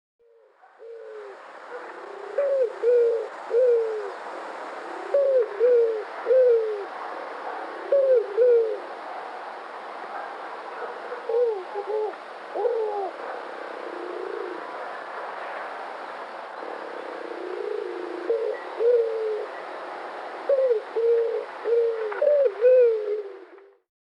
Pigeon a cou rouge Scaly Naped Pigeon, chants des oiseaux; Guadeloupe